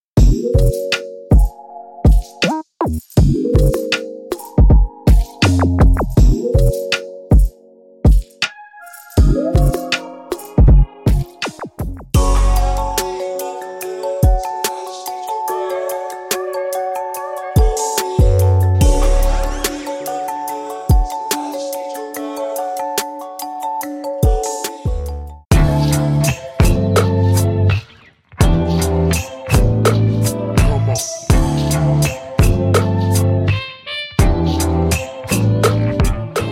Lo-Fi Smoke

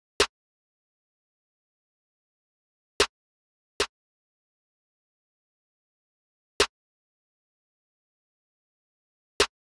Snares, Cm, 150bpm; SAVAGE MOOD vol 2

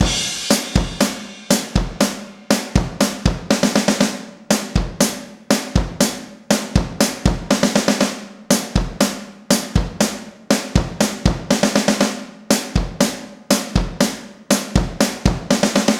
Reaching Drums, 120

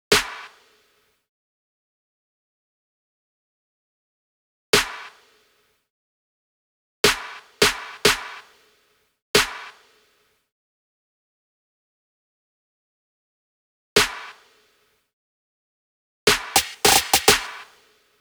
Snare

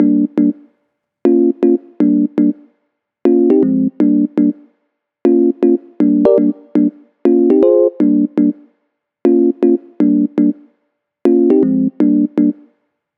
Melody Loop 120BPM Gm

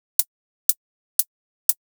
One Shot HH

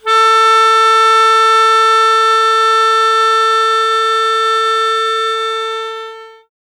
Melodica A Hit

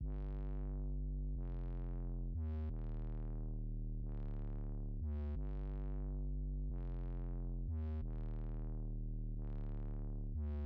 Bass 130; Crystal; OSAKA NIGHTS

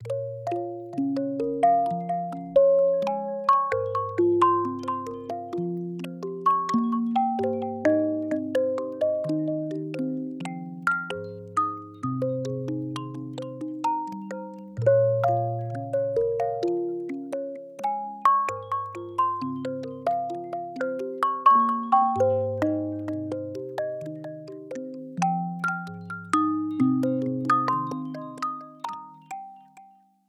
130 synth loop Dbmaj; stone circle

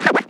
fx scratch

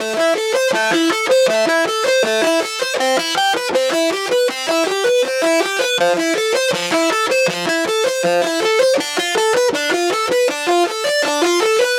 160 guitar loop arpeggio amateur, Cmaj

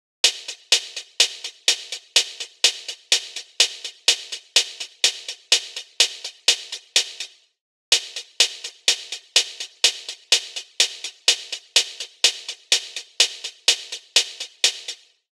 125 drum loop chok hats

